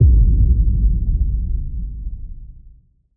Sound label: Impact Low; KSHMR